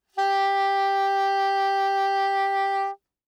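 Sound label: Soprano Saxophone vibrato ff G4; Woodwinds; Bb Soprano Saxophone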